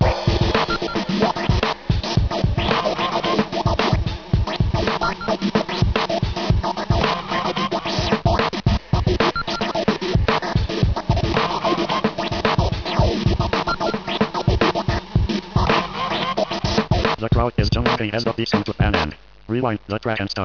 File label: rewind